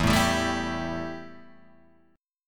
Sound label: E Minor Double Flat 5th E Guitar Open G